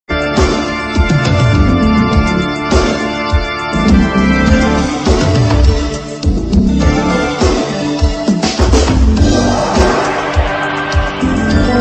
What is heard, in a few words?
Ad Awesome Music; Mrf; Tv Advertisement Tones